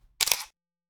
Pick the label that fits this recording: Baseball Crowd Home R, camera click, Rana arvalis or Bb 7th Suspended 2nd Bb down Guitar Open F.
camera click